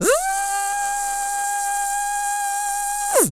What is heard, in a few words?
DRILL; Spectrasonics Vocal Planet; Jazz FX